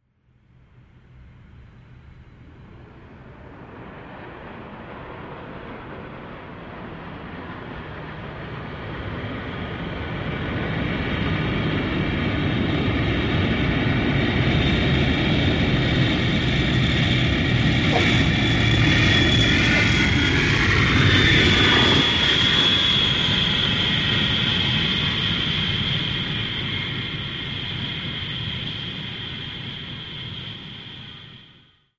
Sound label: Jet Landing Exterior, 727